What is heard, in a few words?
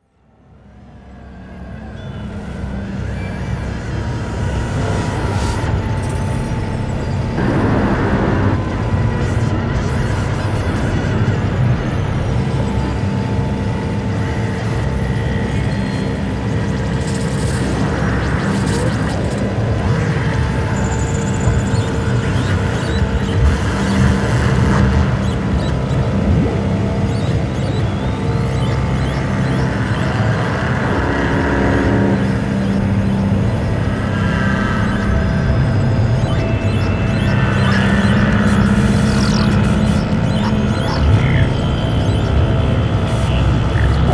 phasing lofi